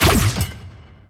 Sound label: sprinkler